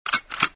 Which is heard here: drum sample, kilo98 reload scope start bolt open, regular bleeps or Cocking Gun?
Cocking Gun